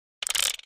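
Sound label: Mechanical Gear Ratchet; machines winches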